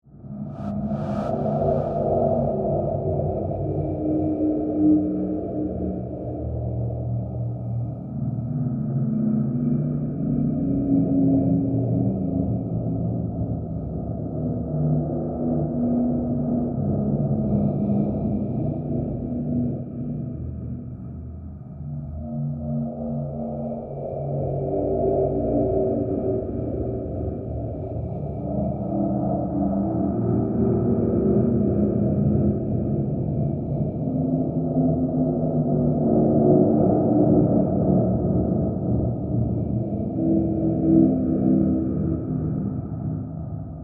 Bed Ghostly, Horror, Bed, Ghostly, Presence, Drone; Ghosts (Sound Effects:Horror); BlastWave